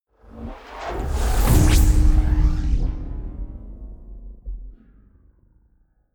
magic spell whoosh gong; swooshes-swishes

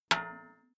can small hit ring dissonant; whooshes rises; hits impacts

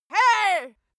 female attack

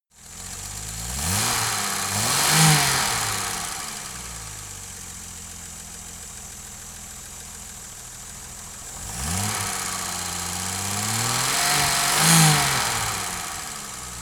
car jaguar e-type startup blips engine

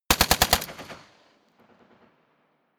rifle ak-47 exterior fire burst light; Firearms; schusswaffen

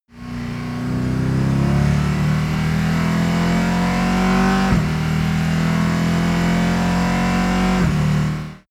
car mercedes sls amg acceleration gear shift

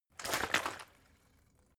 bottle water big shake single; berufe

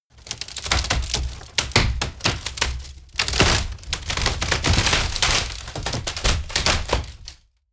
wood break large; berufe; foerster

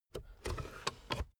car backseat table fold in

